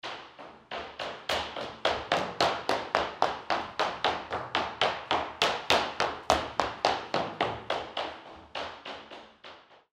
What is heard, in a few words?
steps on parquet floor